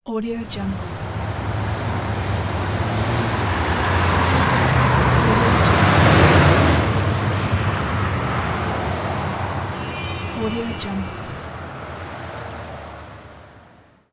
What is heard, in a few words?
city traffic